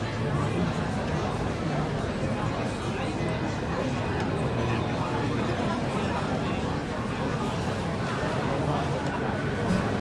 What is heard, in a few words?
Babble; Apple; iOS-26; HearingUtilities